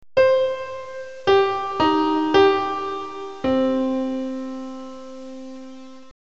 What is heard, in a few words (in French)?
reverb